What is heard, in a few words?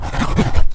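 Scribbling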